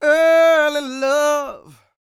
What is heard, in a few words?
SING W, Vocal Planet Blues & Soul, BLUESY; ILIO